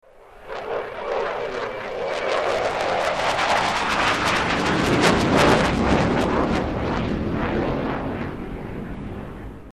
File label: fa18 hornet; laerm